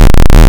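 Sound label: waveform FM square sine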